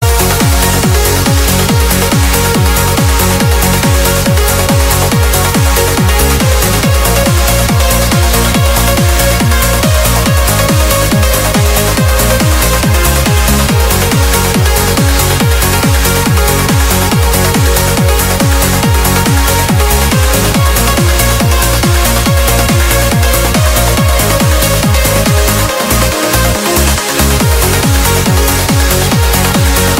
Uplifting trance

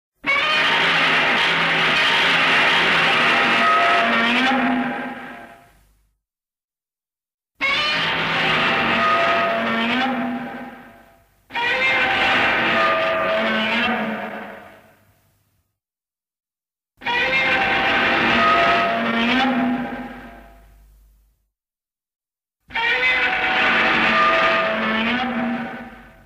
Roar; Godzilla 1962

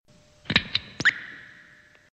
Bomb Armed

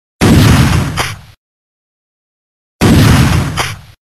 Shot Gun

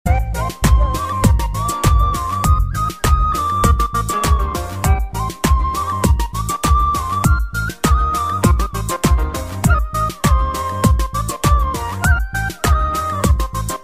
Hip Hop

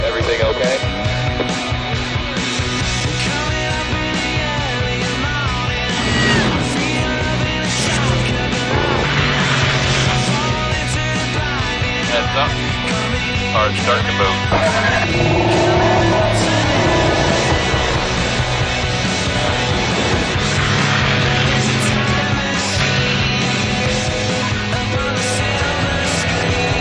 Car Sound rock Music

